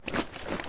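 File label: weapon foley stinger pickup lift; Call of Duty Modern Warfare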